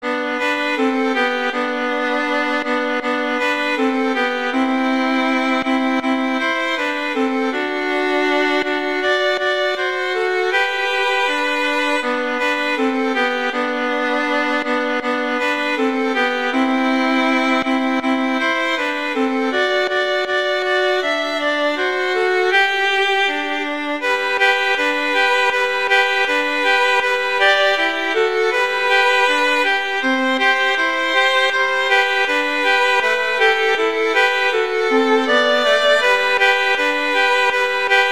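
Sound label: Jingle Bells, violin duets; Christmas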